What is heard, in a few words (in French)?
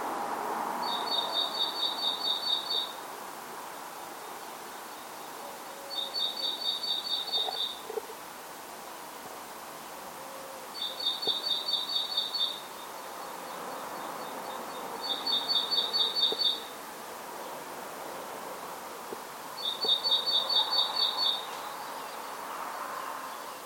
mesange charbonniere; neuilly avenir